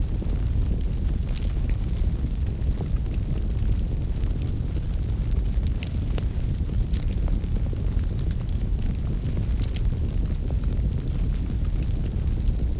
crackling fire dry; wavefield

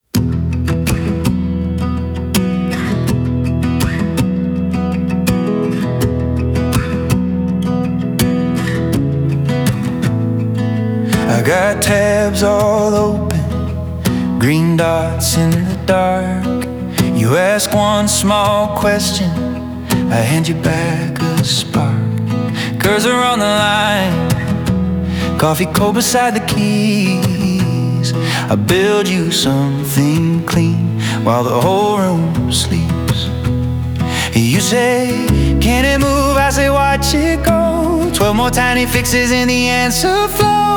folk streaming loud; transientik master; transientik labs

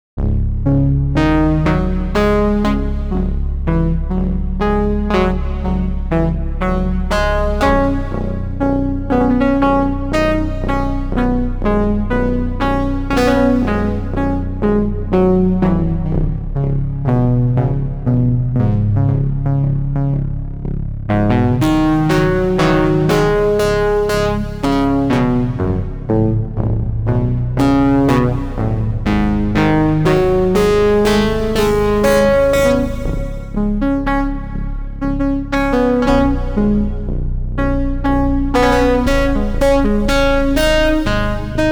deep bass sequence; the digital life

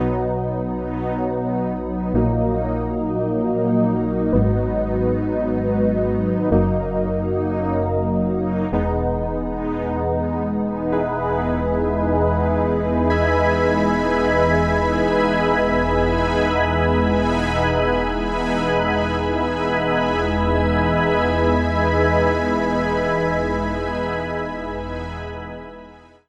nice pad; orbinator; audiodev blog

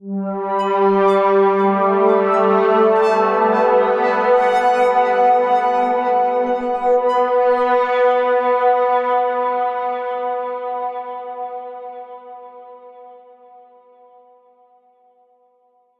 smooth pad dry; kern audio

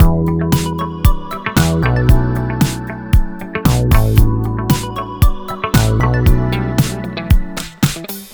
rubber bass; synth bass center; audiolounge soft

